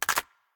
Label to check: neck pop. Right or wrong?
Right